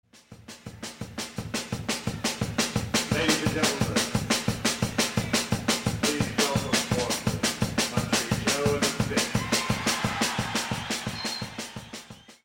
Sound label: Rock Soul Music Live